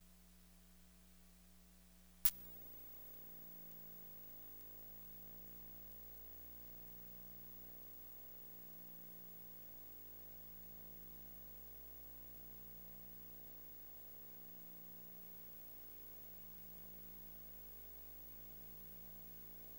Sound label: Bubble TV (Stagnant, Changing Channels, Muting, Etc)